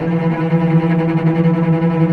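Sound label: cello tremolo E3 mezzo forte left, string; East West - The Ultimate String Collection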